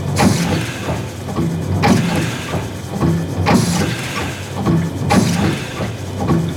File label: Machine Loop; ambience; props; loops; Warframe; Venus Open World; Venus Solaris United